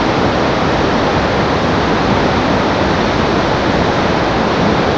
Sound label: fal loop